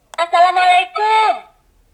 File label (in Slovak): Ukážka melódie zvonenia zvončeka